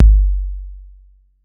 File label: BD 808